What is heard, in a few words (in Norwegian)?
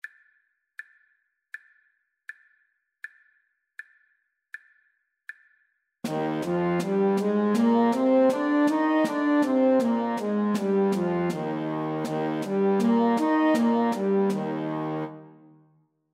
Ess-dur natura middels